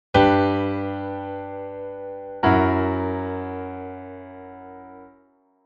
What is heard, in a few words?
prechorus verticalized combined